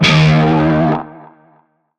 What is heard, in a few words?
Shawzin Flourish Oct One D Sharp; Music; Warframe; Narmer Shawzin